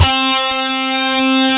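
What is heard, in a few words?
DIST GT3 C3, distorted guitar; DIST GUITAR3; AKAI S-Series CD-ROM Sound Library VOL-7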